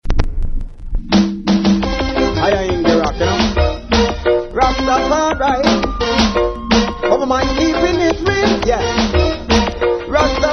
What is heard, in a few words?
Beat Di Drum